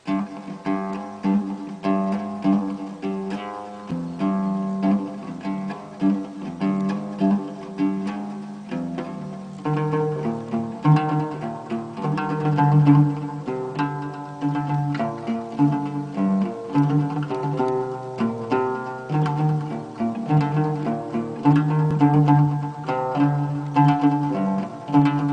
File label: oud